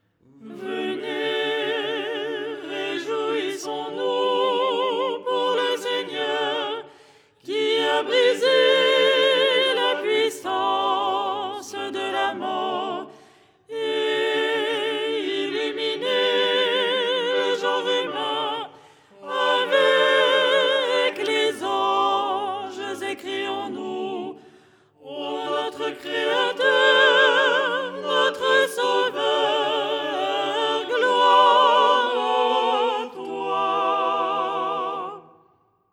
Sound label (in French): soprano; Au lucernaire, 1ère stichère du dimanche; Les 8 tons en français